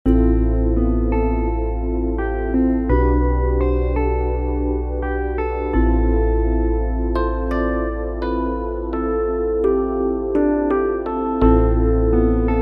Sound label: Gloomy Dive; Romance